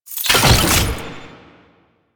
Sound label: Key Deposit; Warframe; Nineteeen Ninety Nine; Exterminate